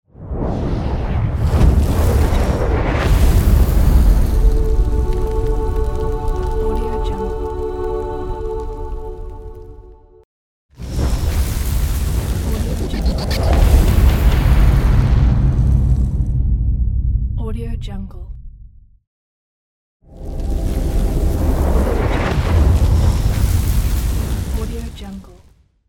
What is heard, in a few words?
fire logo